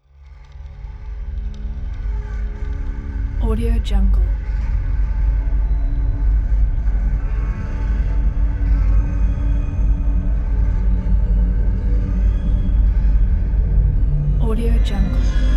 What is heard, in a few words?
paranoid ambience